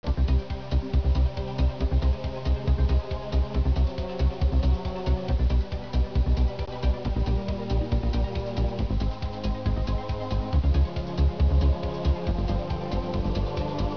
bgsound

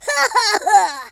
laugh

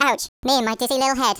ouch; Worms3D; Whoopsie

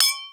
Clink

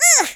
oof, speech, Alien; Worms3D

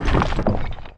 debris; Worms3D

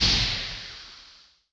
paddle

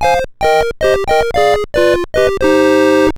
win; Игровые Автоматы; crazymonkey

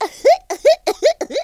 laugh, Cowgirl; speech

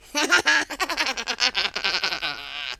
laugh; Spanish Inquisition

